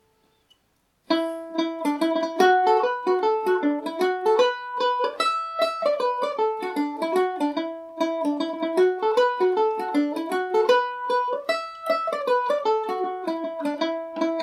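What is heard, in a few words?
E dorian, Irish mandolin; killarney boys of pleasure revisited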